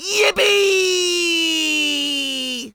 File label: fly vox